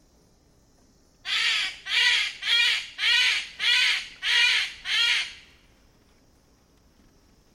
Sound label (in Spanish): sonidos ave del paraiso parotia sefilata